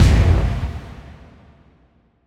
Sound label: Impact Boom Crash; bottlerocket